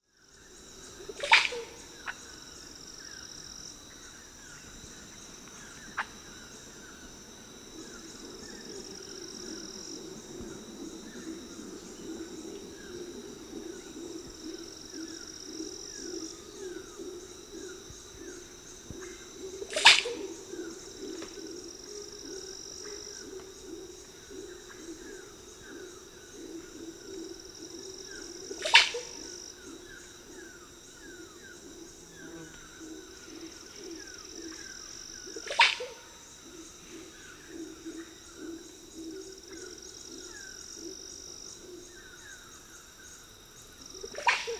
Song and Male wings in flight, Birds of the Costa Rican Cloud Forest